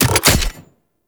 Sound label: Concrete Archgun Charged Mech; Warframe; Archwing